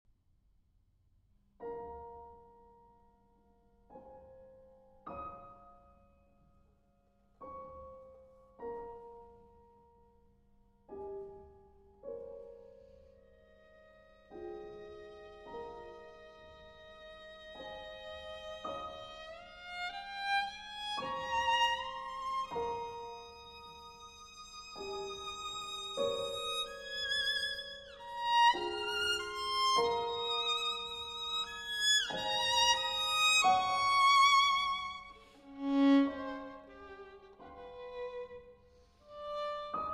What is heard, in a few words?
violin and piano, lullaby, lento tranquillo; Suite For Violin and Piano, Op. 6